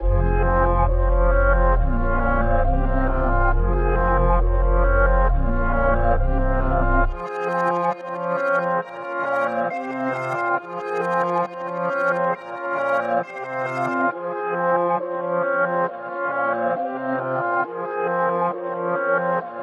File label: 136 BPM F Min, Melody, Reverse; Cymatics; 2022 Melody Collection